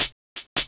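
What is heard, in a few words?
hi-hat loop; HH LOOPS; Ragga & Dub Reggae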